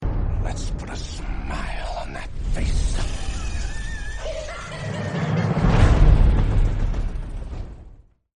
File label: laugh; joker tdk